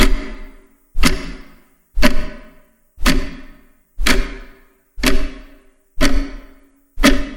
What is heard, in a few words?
clock ticking